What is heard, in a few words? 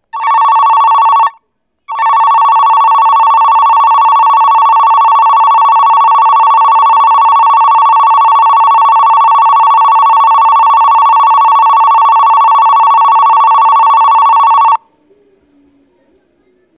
Araimachi bell